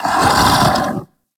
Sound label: Kubrow Tame Sleep Idle Wake C; Warframe